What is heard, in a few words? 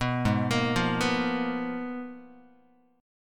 Ab Minor 6th Add 9th, Ab, Guitar; arpeggiated; JGuitar